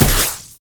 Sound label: grenade; rumble